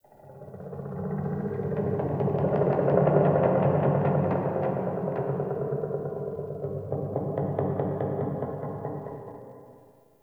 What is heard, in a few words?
Root Creak Large, Root Cave; Ambience; Neathuns Root Cave; One Offs